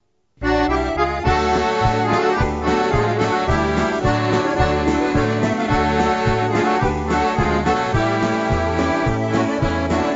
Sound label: polka